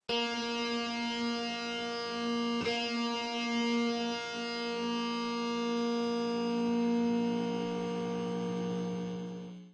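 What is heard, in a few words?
heavy metal tune up 2nd, Electric Guitar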